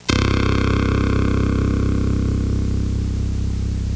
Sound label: deep twang, electronic sounds; Sound Effects for Multimedia - Volume 1